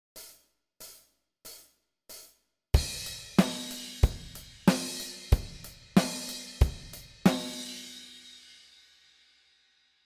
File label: Intro 93bpm, bass